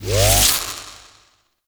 Experiment Rifle Charge Up, Weapons; Warframe; Corpus; Sent Experimental Riffle

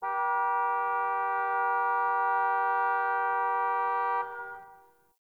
Distant Car Horn

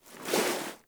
Reload Cannon Foley Start

